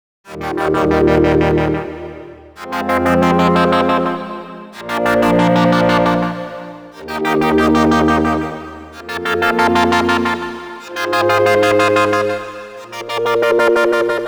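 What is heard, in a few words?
short release seq; the digital life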